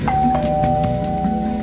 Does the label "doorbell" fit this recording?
Yes